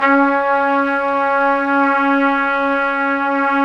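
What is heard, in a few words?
trumpets section; Roland LCDP06 Brass Sections; Tpts Sec.1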